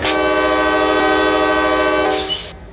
klaxon, Train; P42DC x2 11 Car Superliner Long Distance

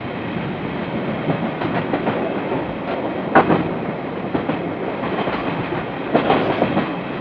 Run, Train; OpenBVE

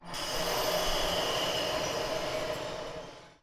air zero; OpenBVE

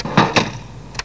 Door Open, Train; MDmot

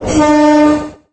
klaxon, Train; OpenBVE

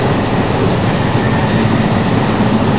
run, Train cl158; OpenBVE

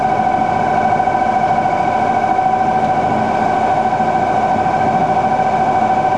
comp loop, Train; OpenBVE; 55022 Royal Scots Grey

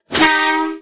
klaxon, Train; OpenBVE

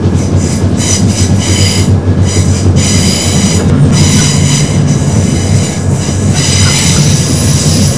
Flange, Train; Siemens ES64U2 8 Car Pull

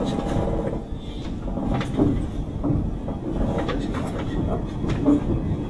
Motor, Train; RBDe560

